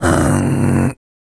VOICE FX, Dance Vocals

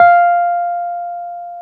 Wurly; Keyboards of The 60's and 70's

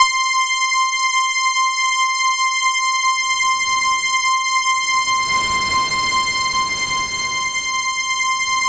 C4, Synth Line; 90 s Sample CDs; NiceBeats; SynthLine VOL-14

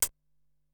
Closed Hi-Hat, Drum Machines Ensoniq SQ-R-Plus; 300 Drum Machines

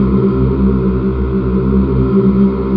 CHOIR; Greytsounds; Scott Peers Sound Engineering

